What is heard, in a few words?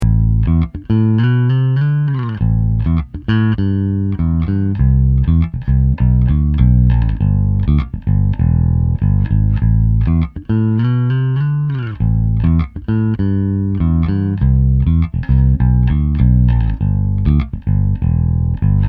scrambler